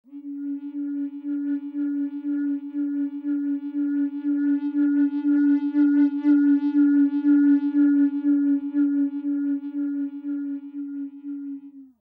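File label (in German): blockflöte phaser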